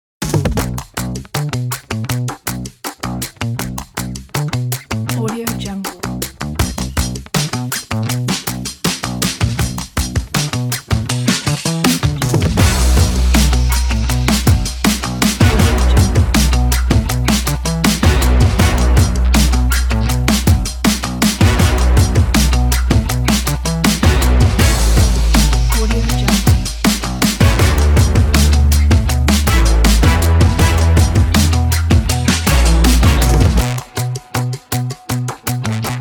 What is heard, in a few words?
upbeat funk groove